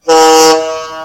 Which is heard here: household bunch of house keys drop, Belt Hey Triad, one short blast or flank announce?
one short blast